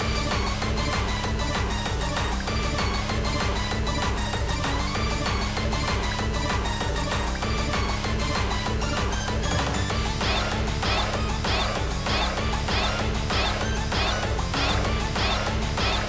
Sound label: BGM Boss Loop; glitch busters stuck on you; MIKOSHI